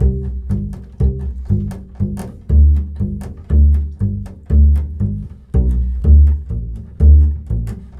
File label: Bull Fiddle, Appalachian